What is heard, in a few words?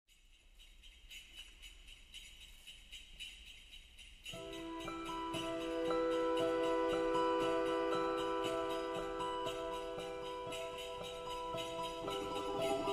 balalaika orch